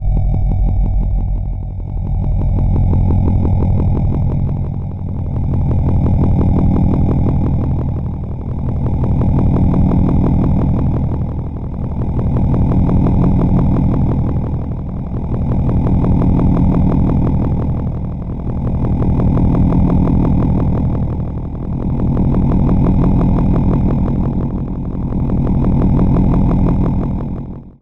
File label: sound design low pulsating mechanical sounding drone